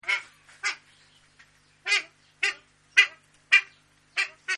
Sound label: Tui, Birds; Animals; Partners In Rhyme